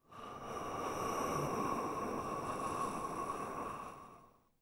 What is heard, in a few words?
BRTH, BREATH FX, Jazz FX; Spectrasonics; Vocal Planet